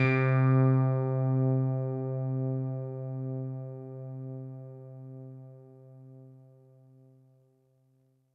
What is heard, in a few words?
Synth Prophet One Shots, Keys C; Electronisounds